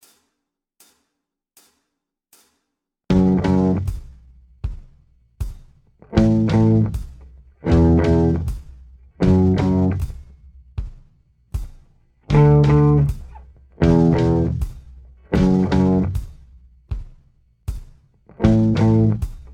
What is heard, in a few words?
riff slower, guitar